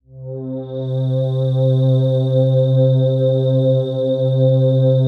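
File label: PAD, atmospheric pads; Best Service; XX Large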